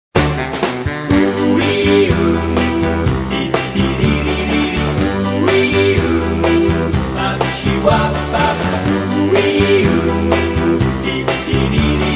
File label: hip hop happy